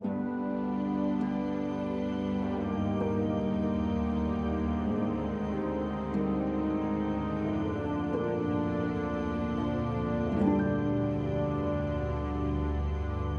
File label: Sting Warm